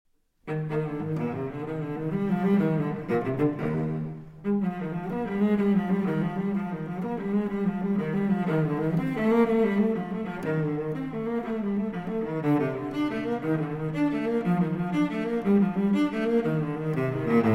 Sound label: Cello Suite in E-Flat Major, Gigue; Cello Suite No. 4; BWV 1010 VI.